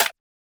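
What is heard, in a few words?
Snare, drum kit; rnb vibez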